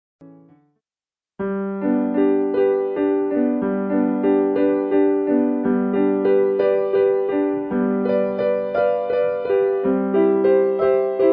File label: Ave Maria, Schubert Vocalist